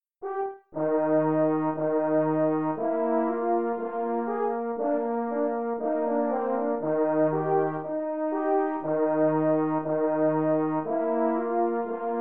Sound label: Easy Classic Duets Musette, French Horn